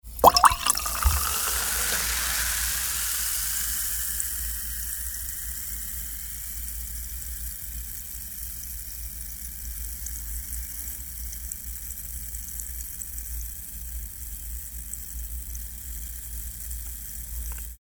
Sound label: soda